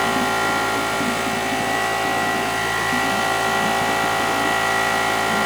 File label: Cloning Tube Loop; Warframe; Grineer Ocean; Ocean Sabotage DNA